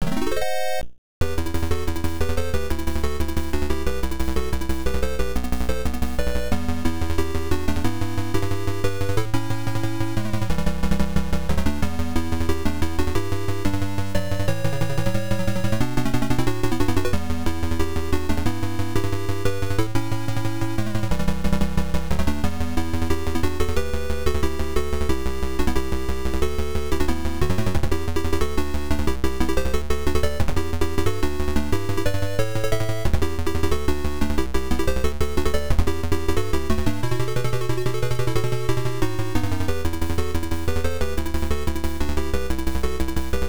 Red Alert; metal gear; msx2

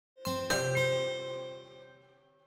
tada

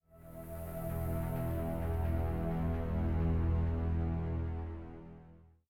Tension

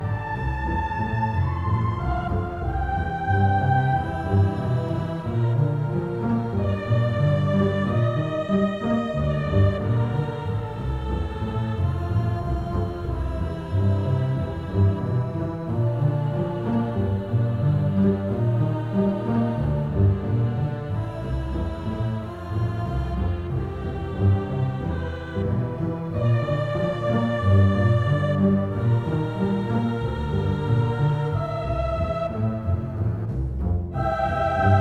Song Loop

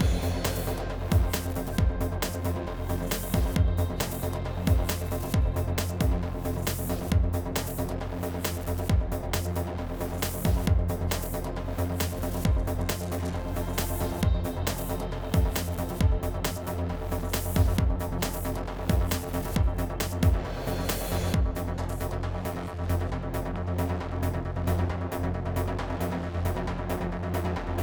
Combat 8CH 135BPM; Rezoning Act1

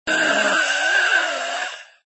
Zombie Death

